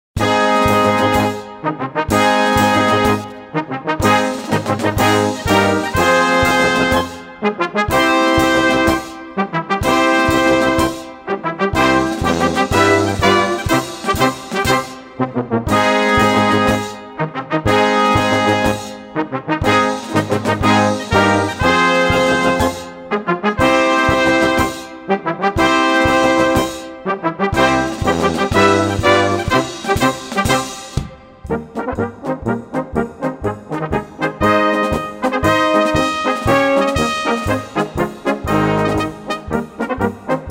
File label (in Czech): Fanfarovy Pochod